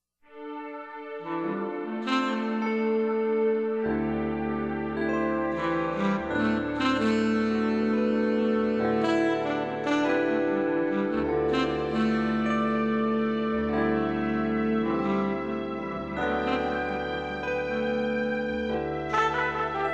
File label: Sax